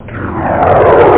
jet; da capo vol1; effects whoosh

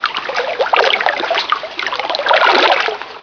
pond water; PC Gamer IT CD 41; Daikatana

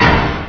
Metal Stamp; CodeWarrior

